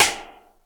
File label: D70 SIDESTK, D70 DRUMS; Crypton - DrumBase5000